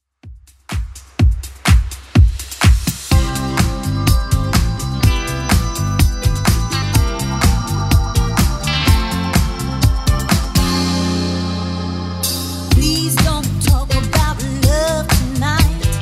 80s Club 125 bpm; 80s Club Edit

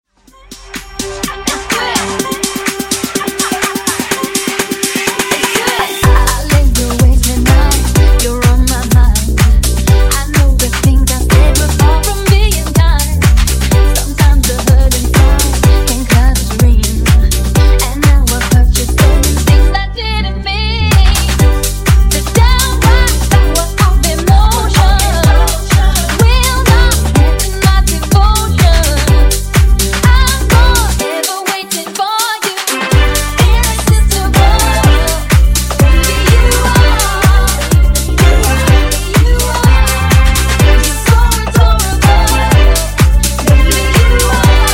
Funk 125Bpm

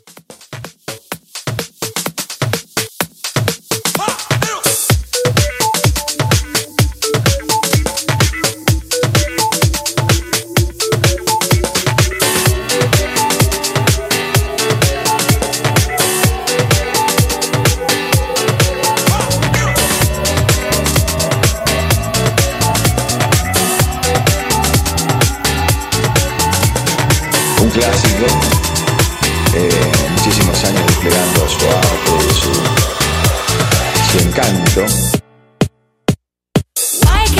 Deep House Dance 127 BPM

